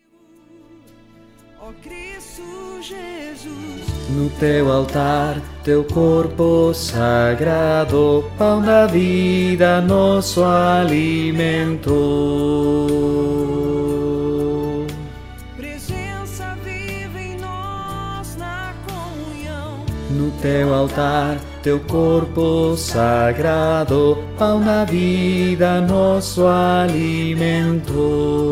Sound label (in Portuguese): barítono; comunhão